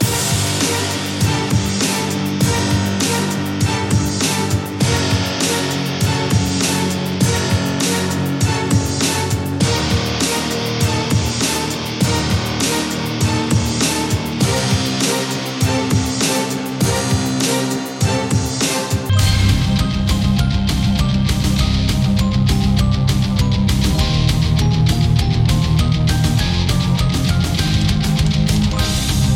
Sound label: Drum Examples; Exhibit B